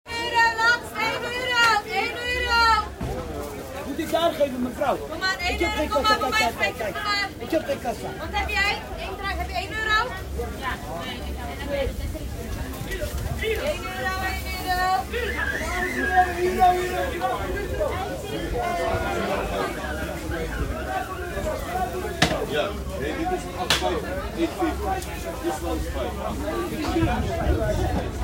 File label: market, Rotterdam; Rotterdam Field Recordings